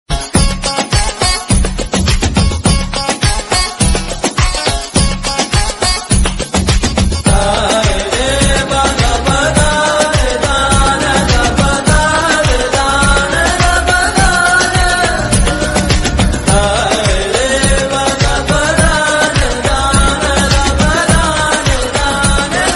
love beatz bgm magical voice ringtone

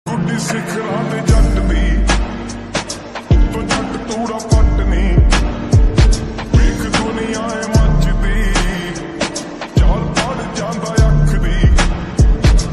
slowed reverb ringtone beats; instagram reels bgm

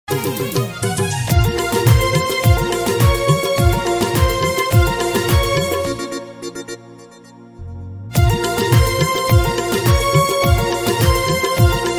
new Odia ringtone, Odia song

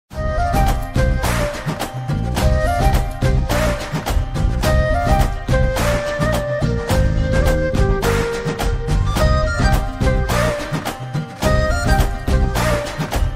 Movie BGM Song BGM New Ringtone Love BGM; amaran

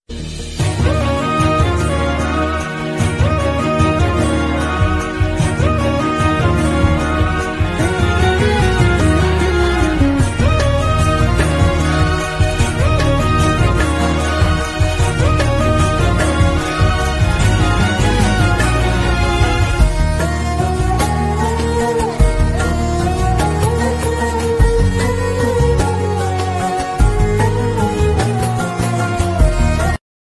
love bgm

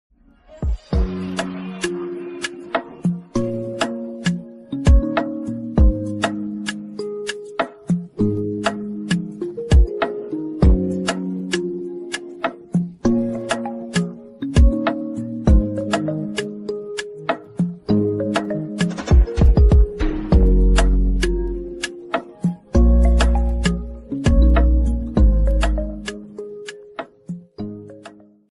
instrumental version ringtone

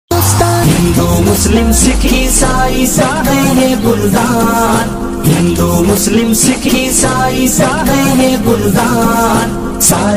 desh bhakti ringtone; 15 august special ringtone

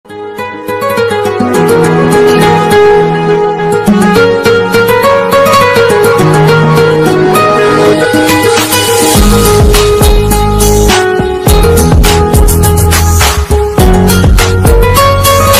arabic ringtone sad ringtone

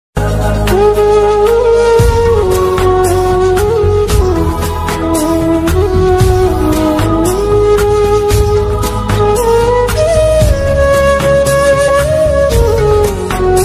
instrumental ringtone sad flute music ringtone

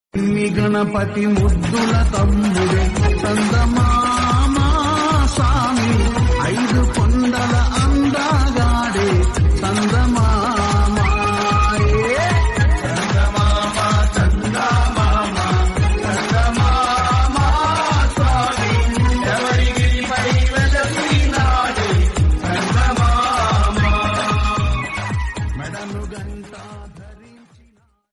ayyappa swamy bgm ringtone; ayyappa swamy special ringtone

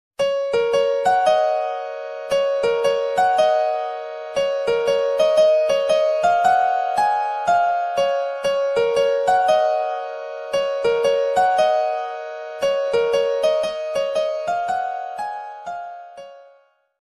friendship emotional bgm ringtone; unnadi okate zindagi

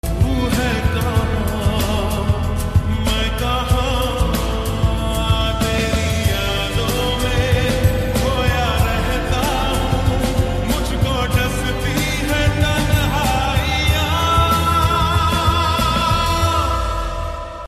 slowed reverb love song

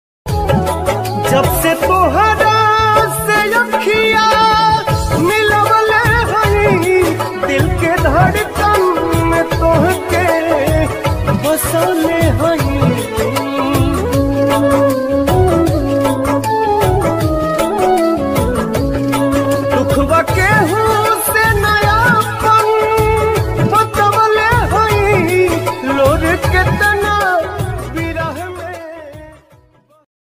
bhojpuri sad song

